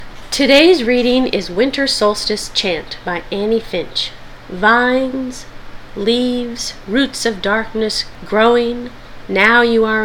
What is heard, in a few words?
Winter Solstice Chant; Annie Finch